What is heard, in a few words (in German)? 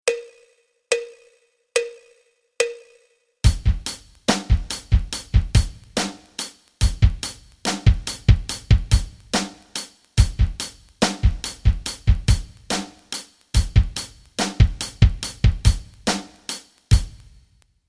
rock rhythmen